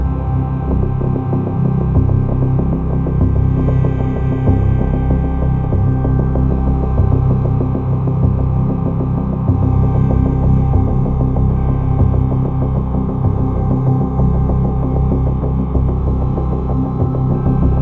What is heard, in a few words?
Suspense, music; rpg